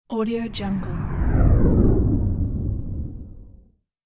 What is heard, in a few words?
burning comet flyby; futuristic; عبور ستاره دنباله دار